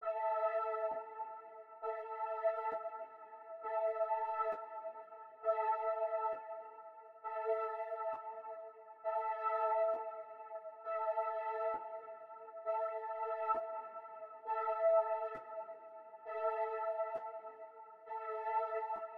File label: 133 BPM A Min Keys, Drill; Melody; Loop Stems; Cymatics; 2022 Melody Collection; Smoke